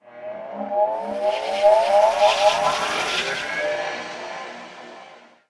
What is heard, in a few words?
Pump Working Start; Glucosoid Pump